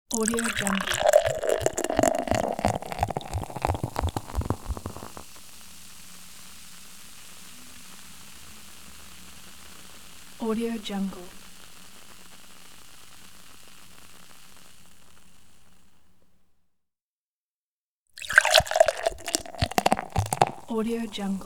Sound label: beer pouring; sviridpavlov